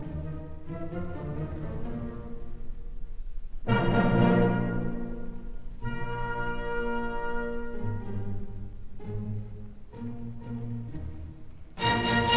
Symphony in E flat major Allegro molto Poco andante Presto; Op 55; Eroica; Bruno Walter